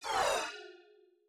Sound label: voice Hit; aquariumboss